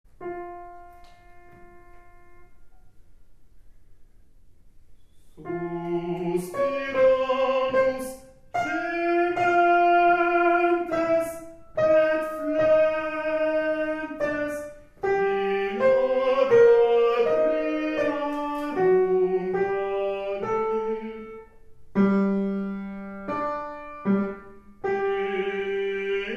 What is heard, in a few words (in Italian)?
Soprani; Salve Regina